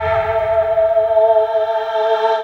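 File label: synth, Production Effects; Best Service - XXL Production Effects; SYN-FX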